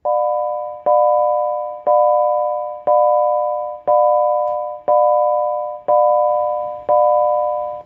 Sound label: Tone